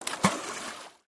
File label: water throw stone